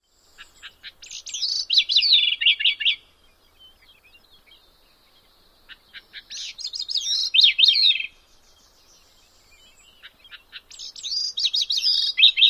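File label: Gray-cheeked Thrush, song number 2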